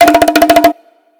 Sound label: Hand Drum, notifications; Huawei; Honor 3X Pro